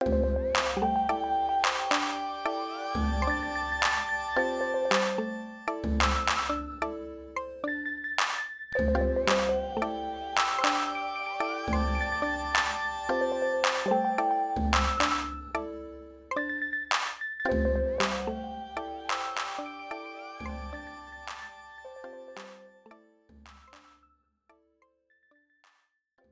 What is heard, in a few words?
NoteFX on